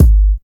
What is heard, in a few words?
prominent kick drum single hit g sharp key